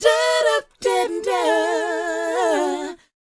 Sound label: DOWOP B; ILIO; Vocal Planet